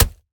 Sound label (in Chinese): 泥坯破坏声